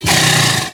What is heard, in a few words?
snort